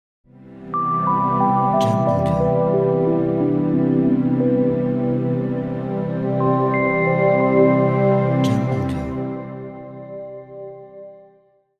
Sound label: Ambient Logo